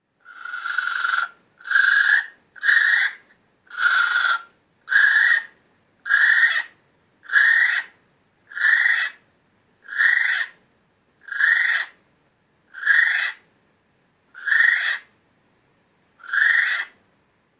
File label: Female Kiwi Calling